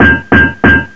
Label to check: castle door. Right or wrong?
Right